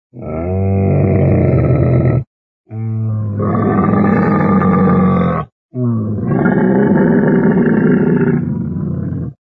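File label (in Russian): zvuki verbljuda, camel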